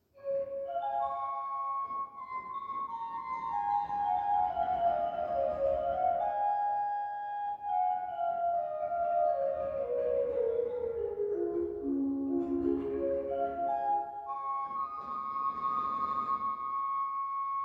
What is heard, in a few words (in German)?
Flaut travers 8, Orgel